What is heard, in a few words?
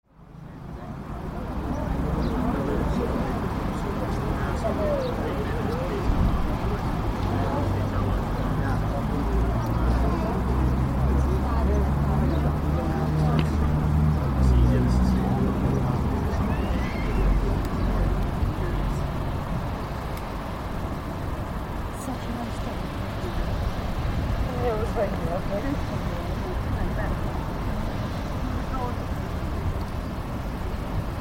Wellbeing Walk Soundscape